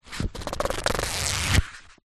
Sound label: household book thick paperback pages flick through fast; zapsplat